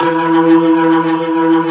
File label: E3, Keyboards Old School; USB Soundscan vol.09; AKAI; BIG HAMM